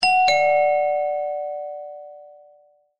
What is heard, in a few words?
Dingdong